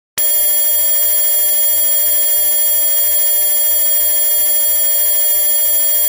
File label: Mosquito Buzzz